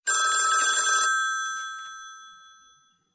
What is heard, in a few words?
old phone